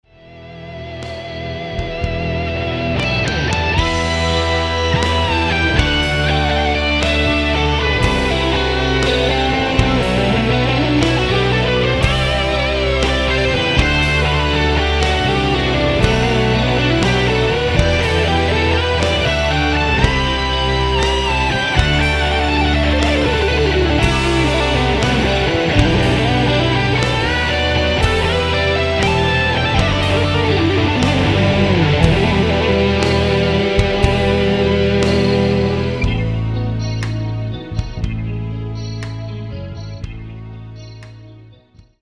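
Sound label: electric guitar progressive rock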